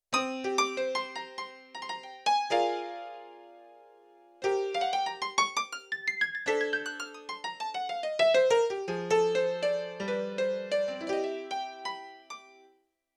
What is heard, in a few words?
Raw Data 61 Key High, Yamaha CFIII Grand Piano; Yamaha TX16W